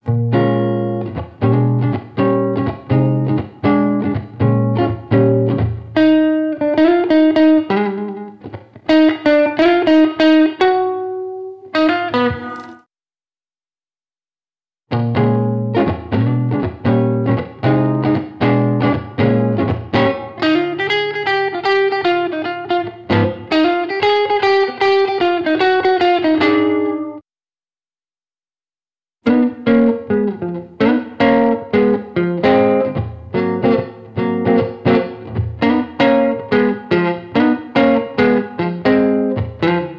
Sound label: Jump Blues; Guitar Techniques 2019 Issue 296 June